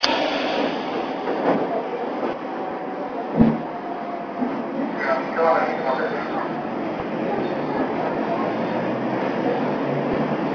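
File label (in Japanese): 上毛電鉄デハ350形Ｂ 城東駅出発時の走行音; 城東駅出発時の走行音（1999年6月5日収録）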